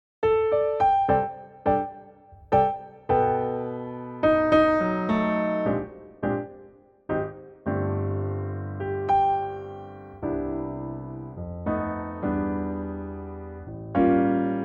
C piano

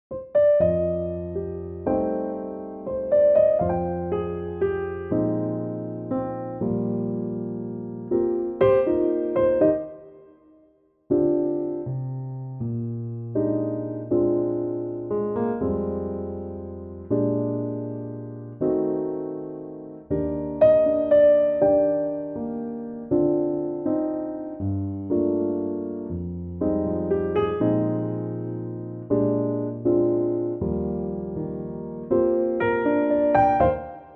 piano

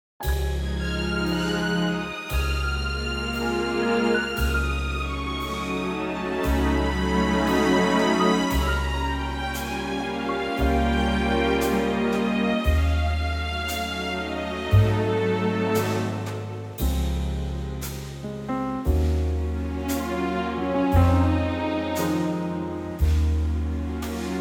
Db orchestra

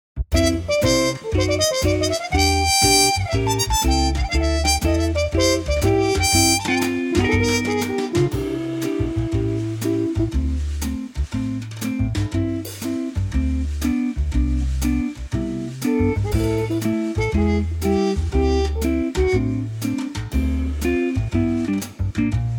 C swing

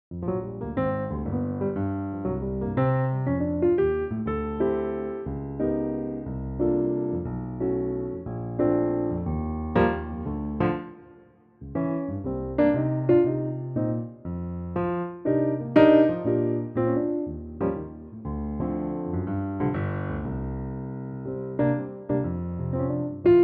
Bb Piano

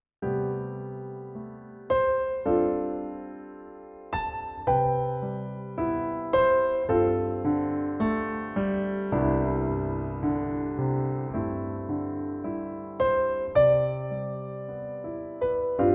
C piano trak

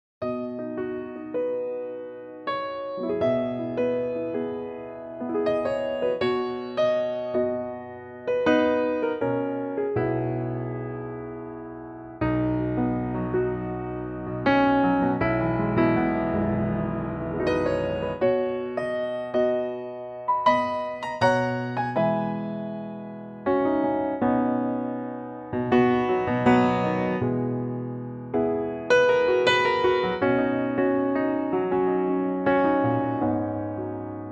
B piano

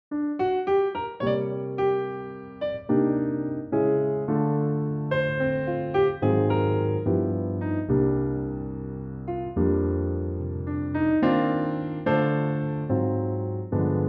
Bb piano

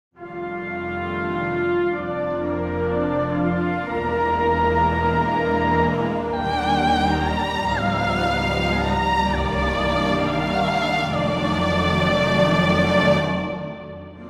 Bb orchestra

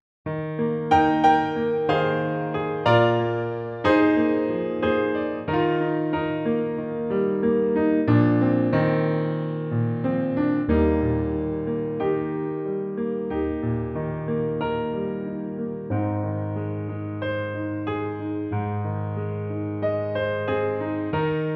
piano Eb

